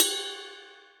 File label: bell, virtual drum sounds